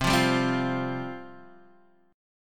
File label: C Suspended 4th C Guitar DADGAD; down; chord shape